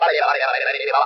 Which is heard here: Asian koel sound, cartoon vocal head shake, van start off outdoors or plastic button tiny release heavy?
cartoon vocal head shake